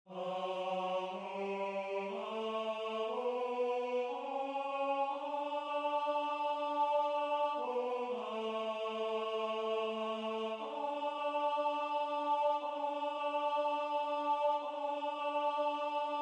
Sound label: OBOE voce